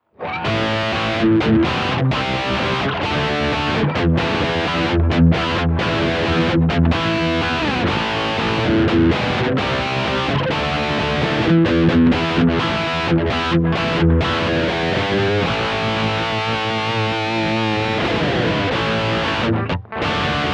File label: Profiling Kemper Highgain Rhythm; Profiling versus Capturing